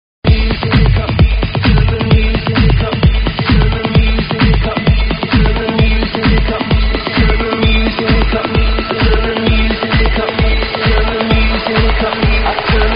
kick; multitrax

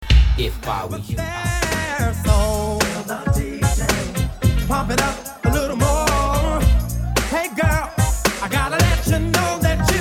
funk; multitrax

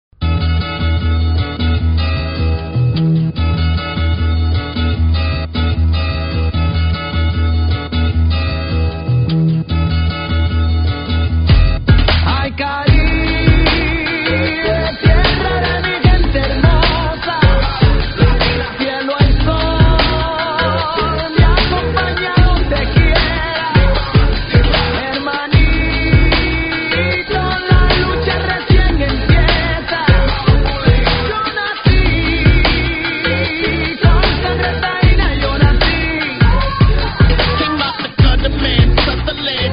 funk; multitrax

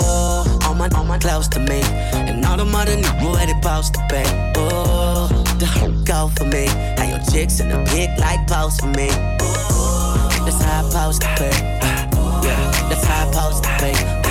funk; multitrax

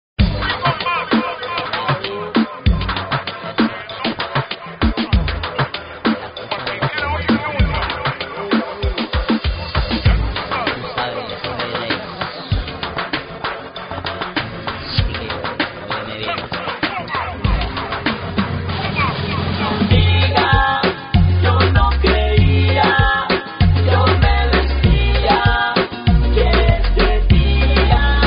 reggaeton, fitness beat, funk

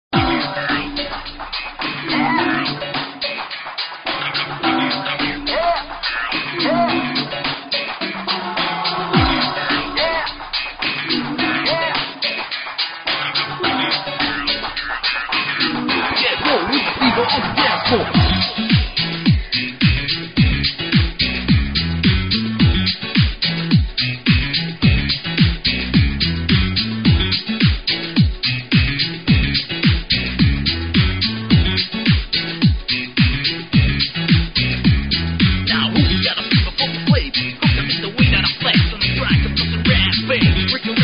funk ultra hiphop

